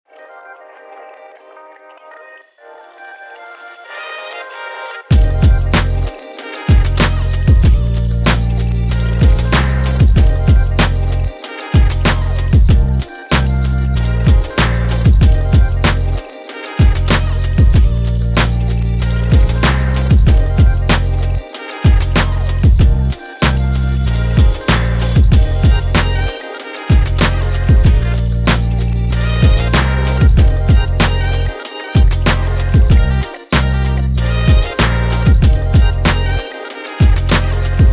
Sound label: The Hip-Hop In Hip-Hop No Scratch